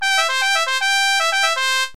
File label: Trumpet Call